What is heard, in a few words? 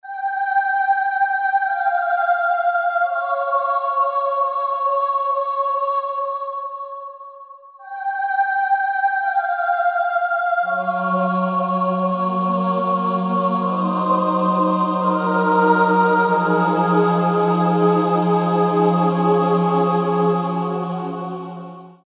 Gothic Vox, Yamaha PSR-3000 choir & pad; Factory Voices